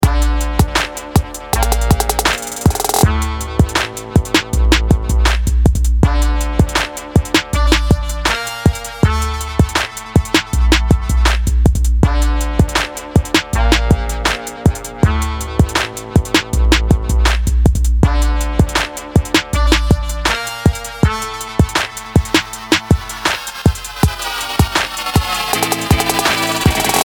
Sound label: Desert Hip Rock